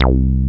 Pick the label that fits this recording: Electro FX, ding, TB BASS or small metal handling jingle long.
TB BASS